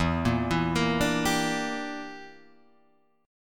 E Minor 7th Flat 5th E down

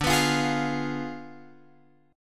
D Diminished 7th D, Guitar, Open F; Ddim7 strummed; x,9,11,12,11,12

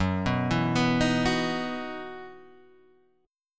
E Major 7th Suspended 2nd, Gb

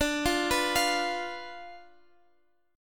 D Minor 6th Guitar Half Step Down

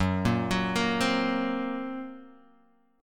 Gb Major Flat 5th Gb, Guitar Drop D; chordshape; down

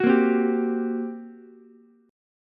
G Minor Major 7th Double Flat 5th, G, Ukulele; strummed; JGuitar

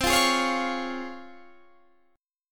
Db Major C; Db/C; strummed

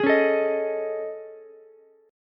A Major Sharp 11th, Ukulele, C6; AM#11; strummed; JGuitar

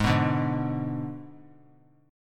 Ab Diminished Ab, 3C, 3F, 3A, 3D; Abdim strummed; JGuitar

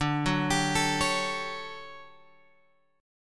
D Major 11th D, chord; DM11 Chord {10 9 X 12 10 9} arpeggiated

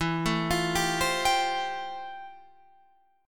F Major Add 9th E; Fadd9/E arpeggiated; JGuitar

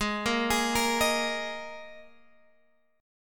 Gsharp Suspended 2nd, Guitar, Open G; 18,15,18,15,16,x

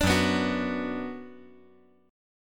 F sharp Suspended 4th Sharp 5th; strummed

